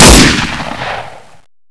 357 shot

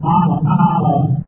tattle, alien assassin; scmod

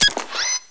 drone active

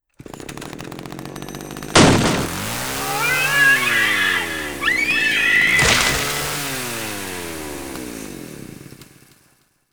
Chainsaw baby, ambience

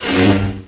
saber miss, weapons

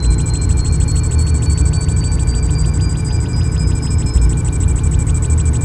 fastline beep; ambience

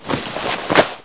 papers

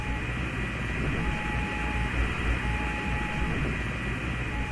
robo amb

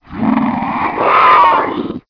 zombie poison pain

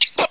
idle, headcrab poison; hl2

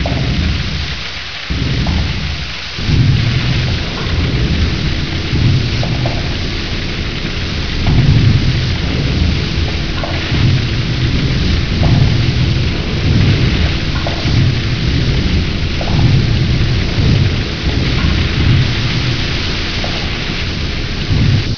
river

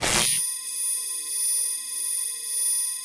trip laser

